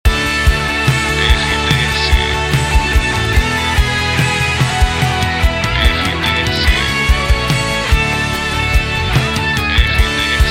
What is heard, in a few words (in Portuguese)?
trilha para locução; Pacote RBC, São Paulo/SP emissora gospel com características populares